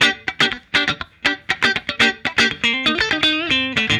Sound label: Guitar, 70s Analog Funk